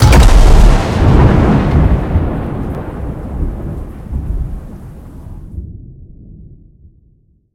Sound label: scatter Hit; riches of midgard